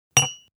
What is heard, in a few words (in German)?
Ei an Glas